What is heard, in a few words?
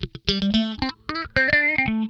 Funk Guitar; 90 s Sample CDs; Zero G